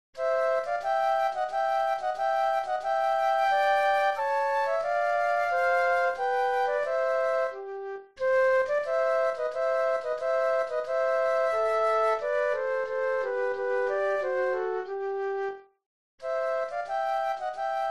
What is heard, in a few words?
Minuet Flute Duet; classical